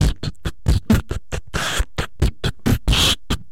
beatboxing

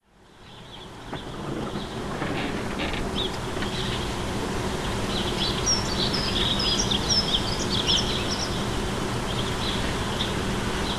urban birdsong

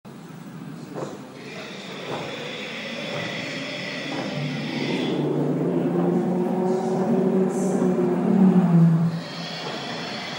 walking with dinosaurs at national museum Cardiff

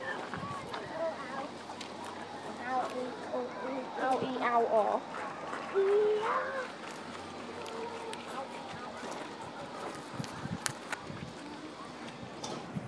steam train leaves platform

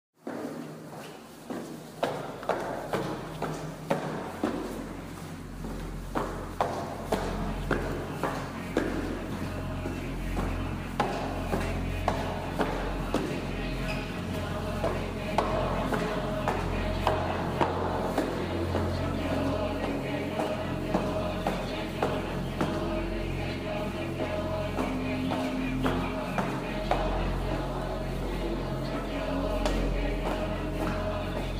stairs and chanting remixed

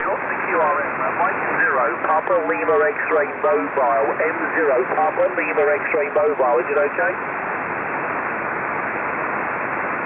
15 ssb

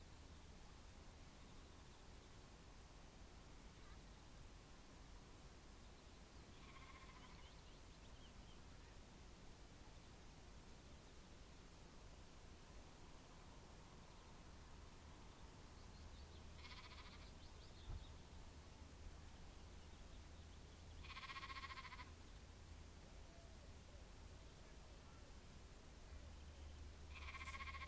peaceful skye baas

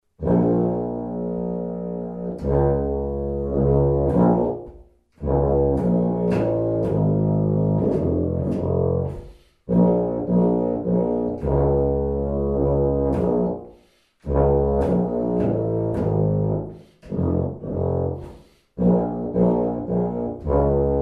tuba rifffff

